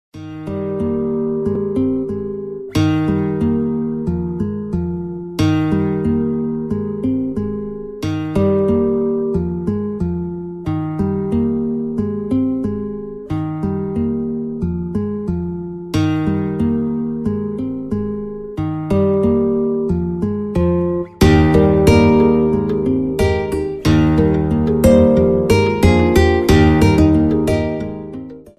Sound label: hawaiian guitar